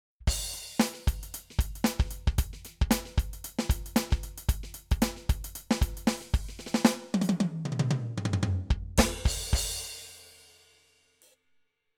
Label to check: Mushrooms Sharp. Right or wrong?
Wrong